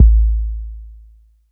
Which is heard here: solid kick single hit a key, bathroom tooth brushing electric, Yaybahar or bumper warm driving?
solid kick single hit a key